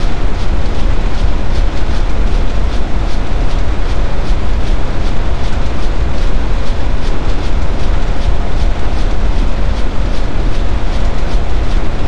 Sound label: fan large whoosh